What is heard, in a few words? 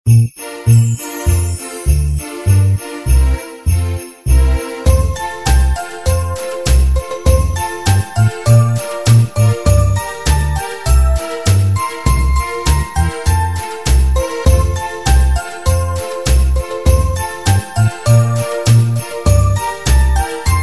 Jingle Bells Background